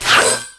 Critical hit; 100rogues

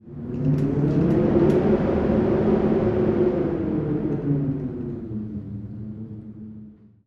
Cave wind; 100rogues

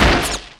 Mine impact; TinyTanks

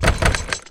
Door is locked; 100rogues

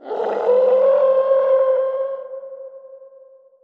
Dungeon screams; 100rogues

